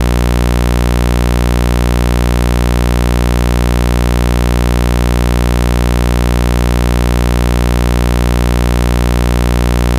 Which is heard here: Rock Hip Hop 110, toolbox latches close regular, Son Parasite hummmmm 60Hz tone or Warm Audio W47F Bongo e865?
Son Parasite hummmmm 60Hz tone